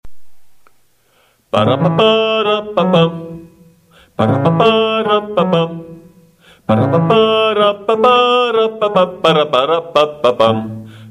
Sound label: candombe